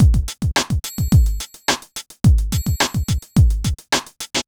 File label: r and b